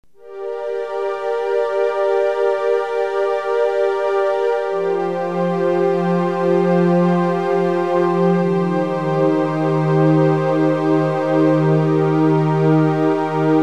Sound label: strings